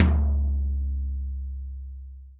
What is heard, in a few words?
TOM, Real Drum Kit